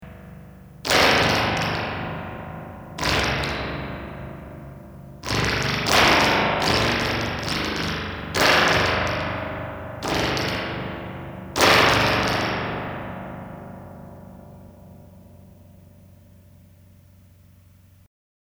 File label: Behringer 646 Sproing